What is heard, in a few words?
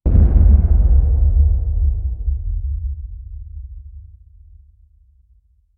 a loud deep boom echoing through a canyon